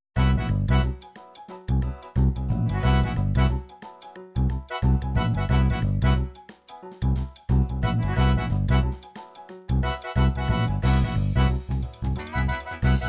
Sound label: latin cajun, midi